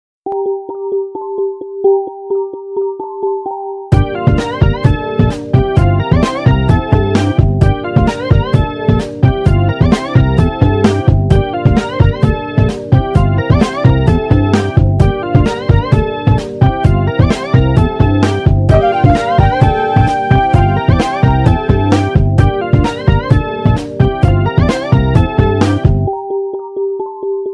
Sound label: iphone xylophone